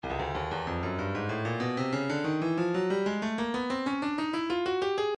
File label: breakbeat 094 bpm shaker; innerbeat; Stylus Breakbeat Midi Files